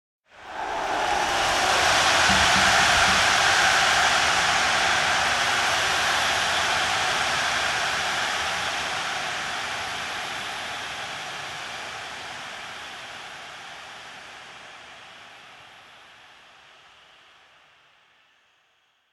Crowd Cheer Goal Shouts Large Sports Stadium Crowd - Drums; Hollywood Edge; sound designer's toolkit v2